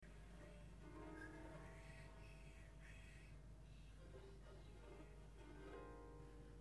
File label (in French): effleur, harpe; chiffon